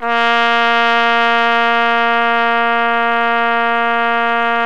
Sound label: TRUMPET; Best Service Gigapack 1